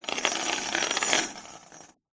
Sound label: industrial chain medium sized lay down coil on external wood floor